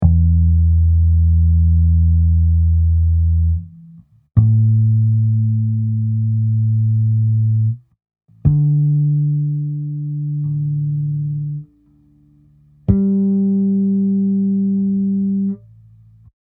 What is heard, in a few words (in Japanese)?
12F ハーモニクス